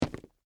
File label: Dress Shoes Jump, Concrete; Footsteps; Footsteps (Sound Effects:Human)